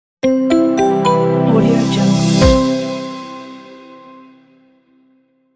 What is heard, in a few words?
corporate pop logo